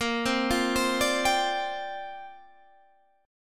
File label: C 7th Suspended 2nd Suspended 4th Bb